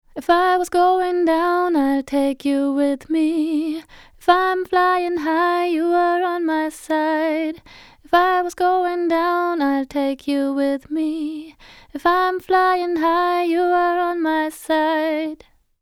Chandler Limited TG Microphone Cassette Vocal EQ A Compression